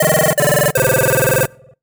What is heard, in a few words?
Solo Chip Square Negative